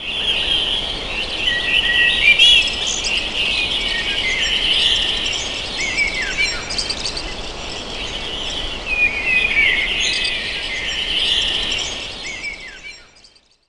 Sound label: Birds, Ambient; Zero-G Jungle Warfare 1, 2 & 3; 90 s Sample CDs